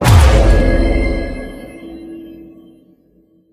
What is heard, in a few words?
fire; Smite; god Khepri